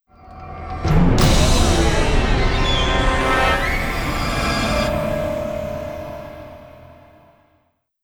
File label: ジングル Mission failed; EDF4